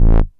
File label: Bass Multi Rev A; Vengeance Samples